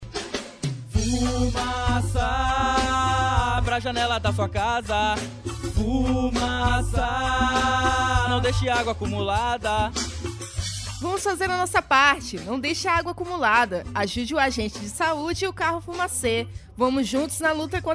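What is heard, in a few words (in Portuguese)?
jingle dengue fumaça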